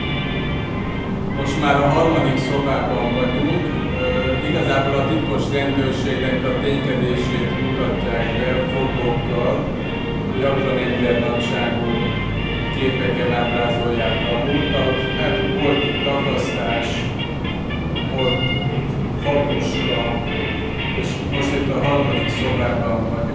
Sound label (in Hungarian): harmadik szoba; bunkart